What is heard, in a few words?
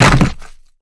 damage, building; physics